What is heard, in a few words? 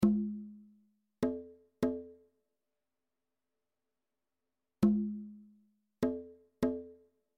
bongos; reciclar tocar